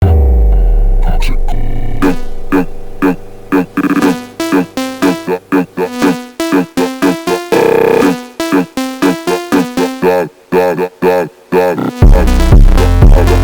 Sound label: phonk